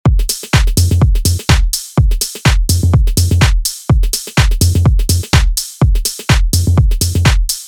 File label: tech house loop 128bpm; monosounds